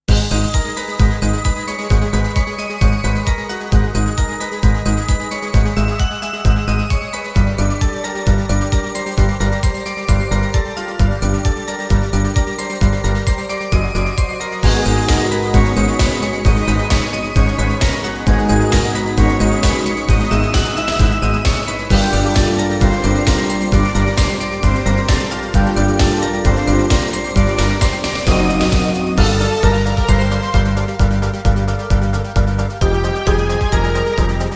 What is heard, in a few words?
questioning (allegro)